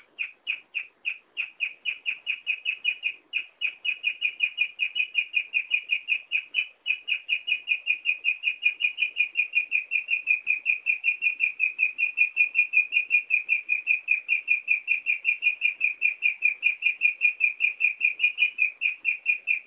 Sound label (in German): Meerschweinchen zwitschern